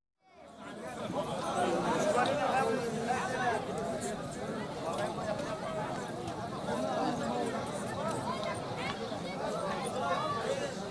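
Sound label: Market Arab, Exterior Atmosphere Ambience With People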